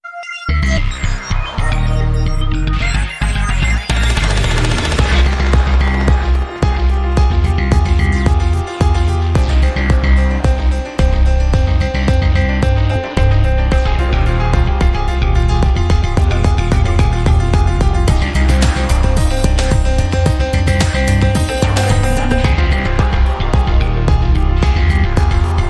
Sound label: Techno